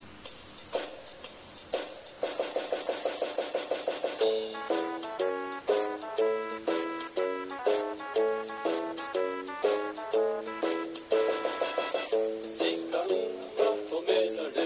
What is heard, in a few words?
taiko